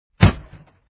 thwump

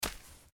biped run

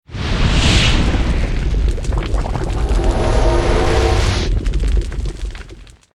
cauldron cast

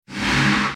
wand autoattack cast

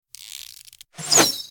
bow autoattack cast; abilities

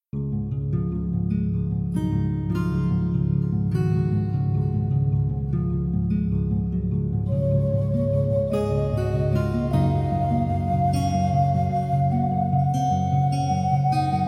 music ambient

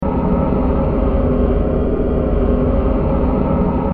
morass warmup

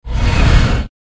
ability disembowel cast; abilities

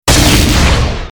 shieldbash cast

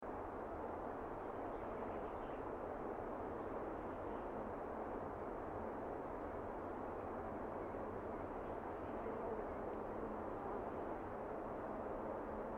ambient cloudy day

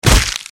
fall damage

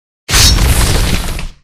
earth shaker cast; abilities

